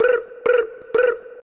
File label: Chirp 3x